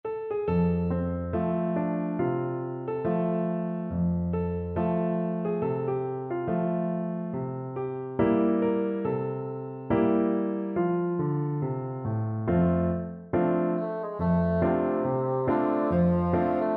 down by the riverside, bassoon

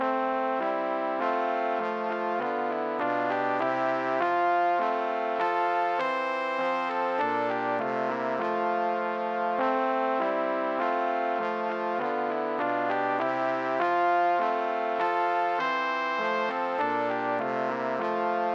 2 trumpets trombone

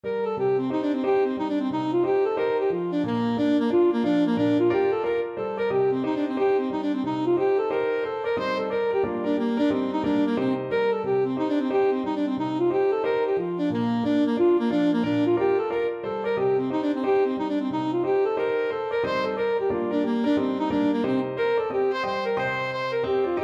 swallowtail, saxophone

